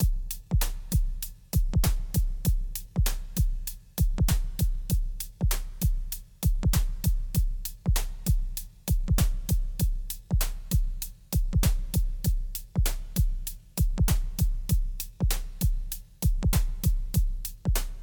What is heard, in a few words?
Eventide Ultraverb Plate Drums